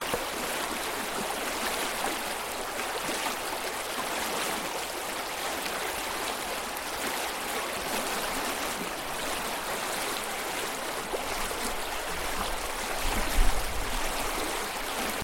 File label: liquid; soundbible